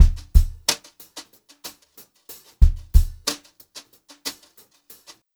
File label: Brushed Drum Loops